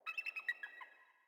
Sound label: sting eagle; Rust